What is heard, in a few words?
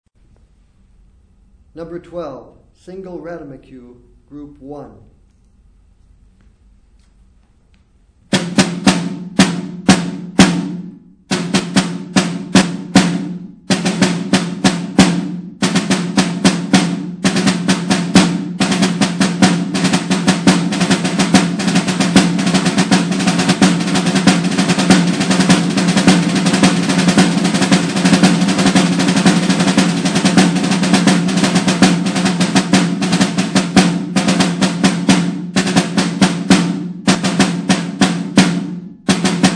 snare rudiment